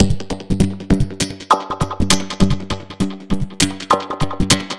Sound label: Robo Beat 100BPM, sci-fi Beats